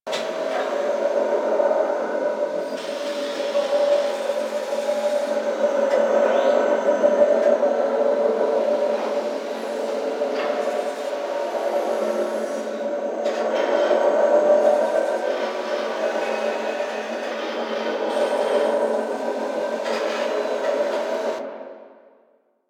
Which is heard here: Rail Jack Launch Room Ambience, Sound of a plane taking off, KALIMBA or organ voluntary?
Rail Jack Launch Room Ambience